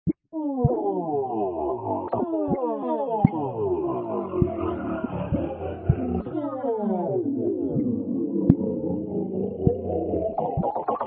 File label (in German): Tiefbass Kaefer